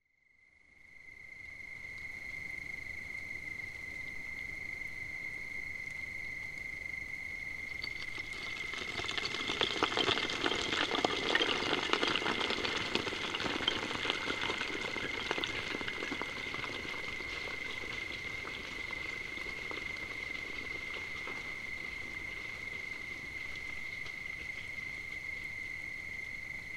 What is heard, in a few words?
night crickets